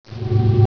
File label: Zap Dialog Light; Bedlam 2 1.1.1; Bedlam Sounds